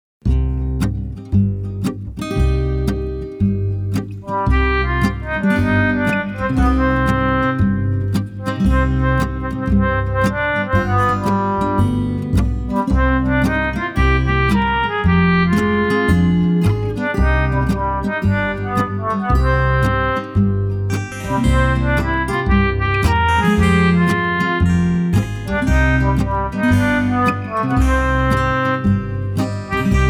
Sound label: oboe